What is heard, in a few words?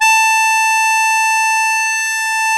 MUSETTE A3, Historical Instruments; USB Soundscan vol.23; AKAI